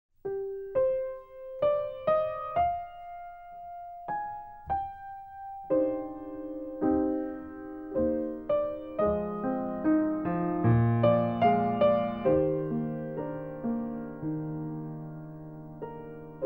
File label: Piano Solo